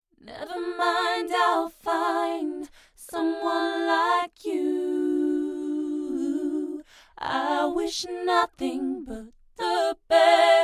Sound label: P87 Vocal Double Harmony